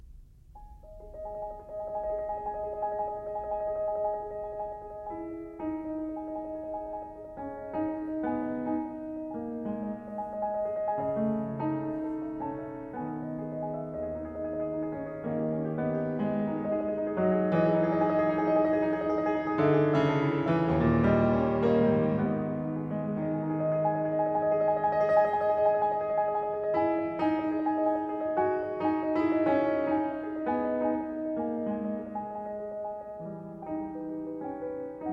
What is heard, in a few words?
Prelude in G sharp minor, piano; 24 Preludes